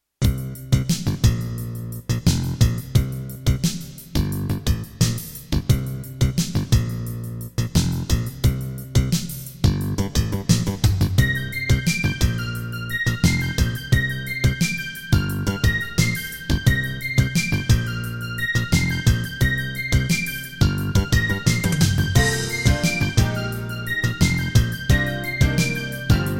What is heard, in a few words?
MidiPlus MiniEngine USB